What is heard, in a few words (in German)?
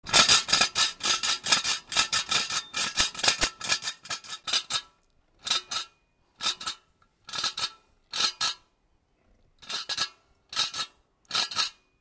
Puderzucker bestaeuber